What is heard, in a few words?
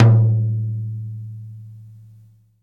Mid Tom Sample A Key, tom drum tuned to the A note. Loudest frequency: 267Hz